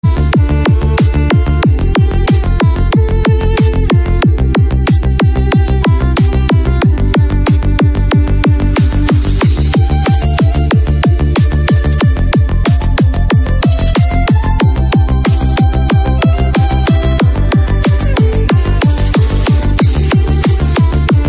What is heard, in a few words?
english bgm ringtone